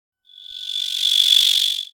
Sci-fi Transition

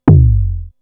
Deep Doink

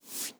Soft Slide Short

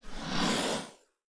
Swoosh Transition

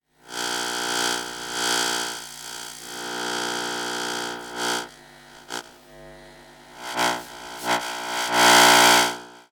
Long Buzzing Power Glitch

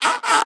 Clean Double Creak

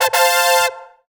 classic game reward

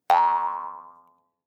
Acoustic Doink

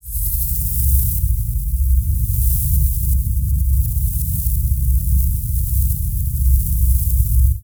Dark Hissing Rumbling Ambience

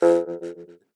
Acoustic Doink

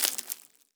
Wet Rustle

